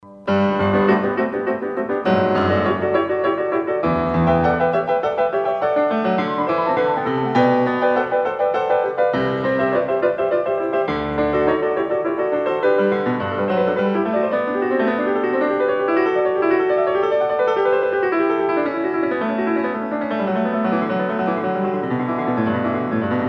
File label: Bach Goldberg Variations